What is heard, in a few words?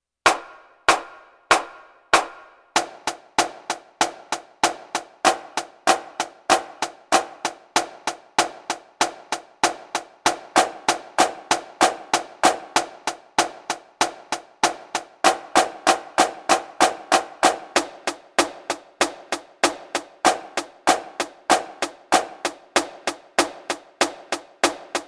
flams